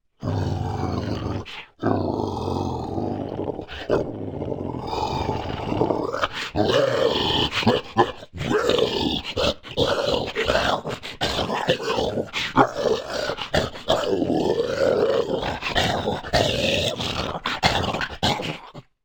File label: Werewolf Attack